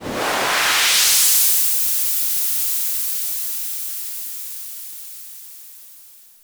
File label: Whoosh, sci-fi